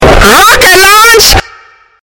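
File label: rocket launch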